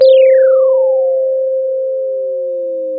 pop, Sound of a phone ringing

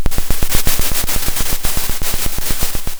Sound of a drum roll

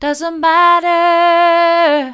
doesn't matter soft, vocal

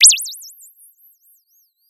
Sound of a bird tweeting nicely

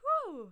achievement moderate; VIVAE

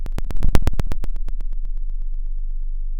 pop, Sound of a fire crackling

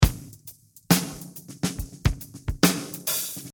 drum Loop mono

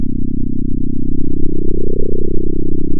pop, sound of a small motorcycle

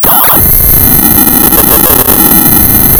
pop, tearing paper

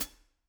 room Pd Hat, drum

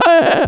fear moderate; VIVAE

